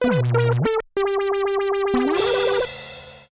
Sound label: Wrong; Chuchel